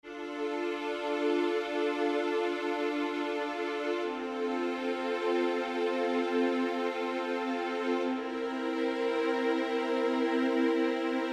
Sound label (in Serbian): akordi gudaci mol